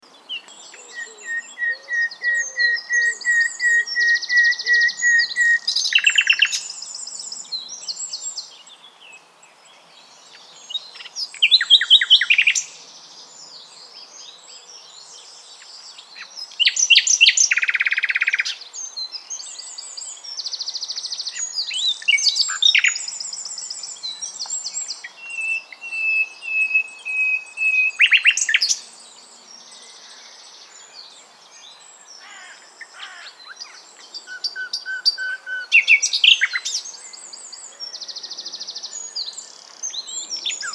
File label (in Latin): Luscinia megarhynchos; SETE